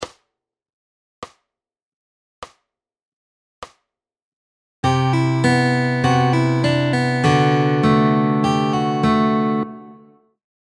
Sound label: Fingerpicking Fun Superlick